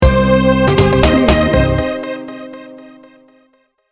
funky junkie sting bumper

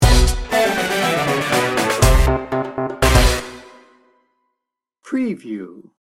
bollywood temple dance sting bumper

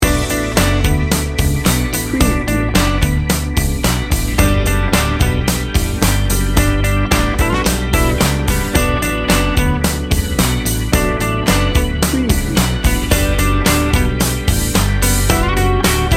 neo soul loop

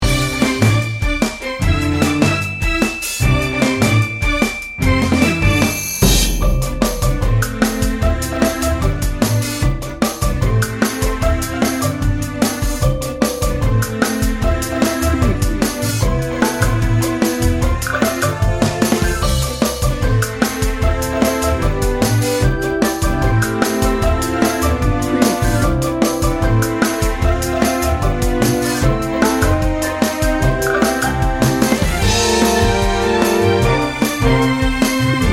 50s game show

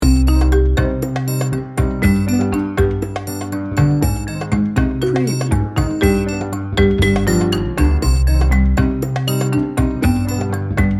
tango mischief loop

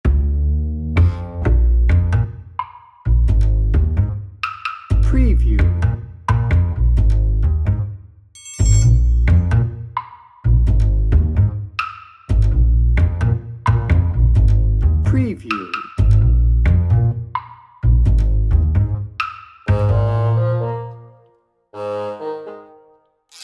mischief and mayhem bass percussion

